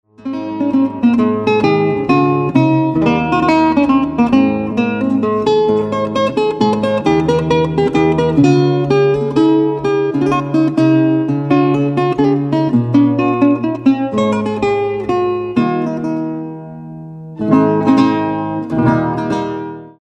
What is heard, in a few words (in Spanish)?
MINUET EN LA M